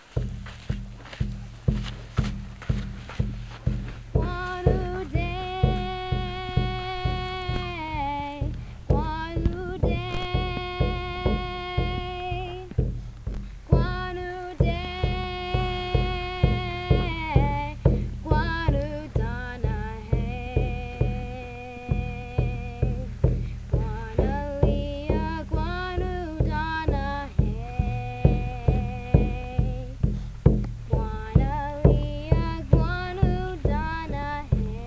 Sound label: drum sing